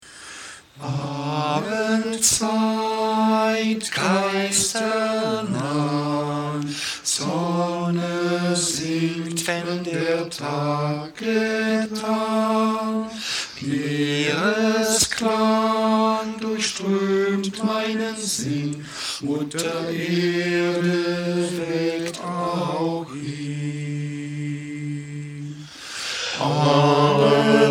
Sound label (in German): Abendzeit acapella; Belsen